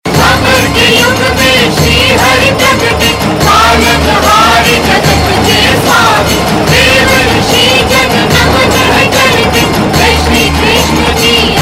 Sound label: Krishna Aarti; Krishna Birth; TV Serial Tone; 9x; Kahaani Hamaaray Mahaabhaarat Ki